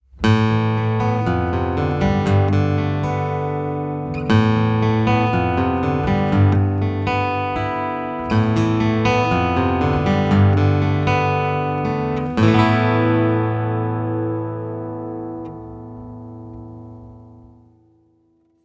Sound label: Seagull Piezo; iRig Acoustic Stage